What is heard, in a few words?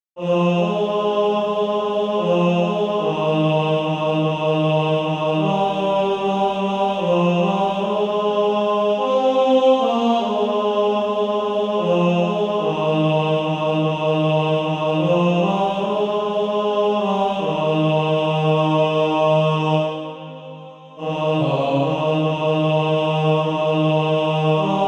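Male Choir, bass 2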